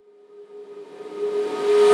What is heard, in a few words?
Tonal Reverse - G#; House; FX; Pottery Tonal Reverse